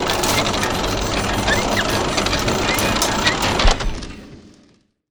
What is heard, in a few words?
archeology event machinery; ancient relics